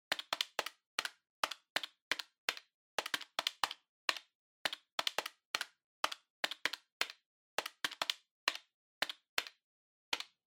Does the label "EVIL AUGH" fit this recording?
No